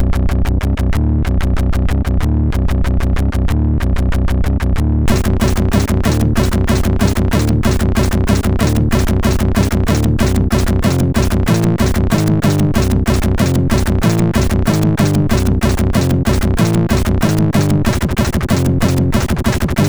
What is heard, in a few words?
Heavy Metal, MOS6581R4